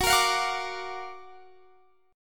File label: Gb Augmented Major 7th Guitar Standard; Gb+M7 strummed; JGuitar